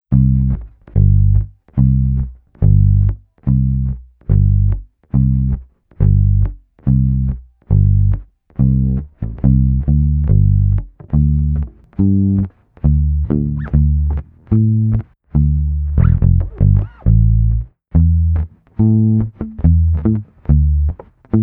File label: Bass Track